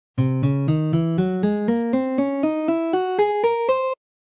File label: c altered scale pattern, guitar